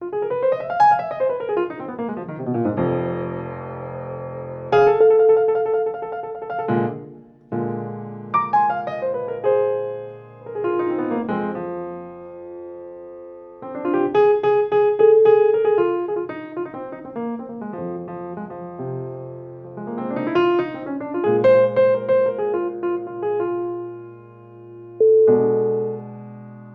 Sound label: intimate pianist